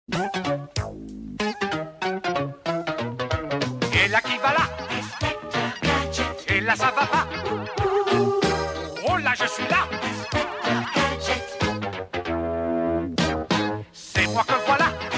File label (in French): sonnerie; Inspecteur gadget